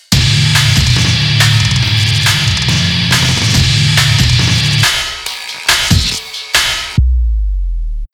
Bong Hit

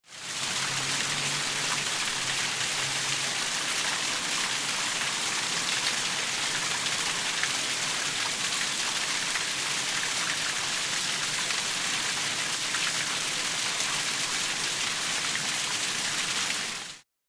water stream

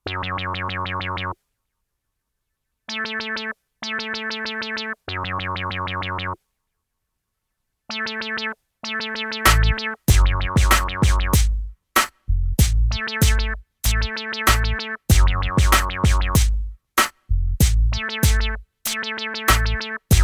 intro song (instrumental)

